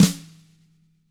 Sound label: TAMA SNRD; ILIO; Double Platinum Drums 1